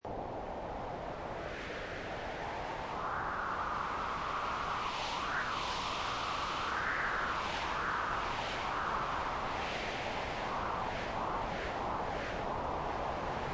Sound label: Wind Busy Loop Long, Busy, fierce wind. Seamlessly looping sound; Seamlessly looping sound, long version.; Weather